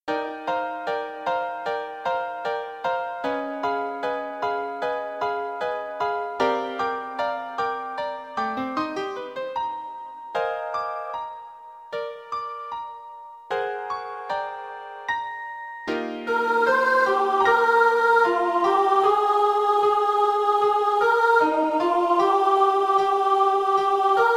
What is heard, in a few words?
Sop, Choir